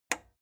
plastic button small simple push

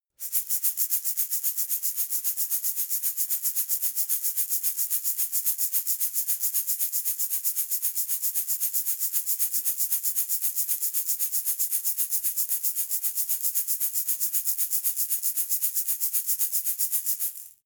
shaker plastic egg rattle slow

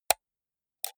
computer mouse right click slow hard

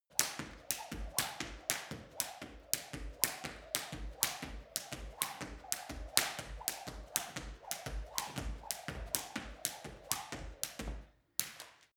skipping jump cross

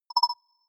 notification spot

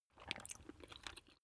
swallow food

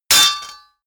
sword parry metal

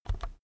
keyboard external key press fast soft

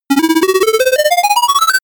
scoring

